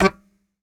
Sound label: Very Short Note, Melodica